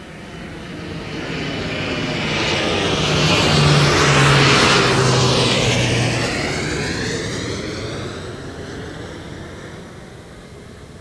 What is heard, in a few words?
plane flyover